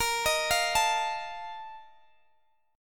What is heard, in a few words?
Bb Major 7th Suspended 4th, Guitar, Half Step Down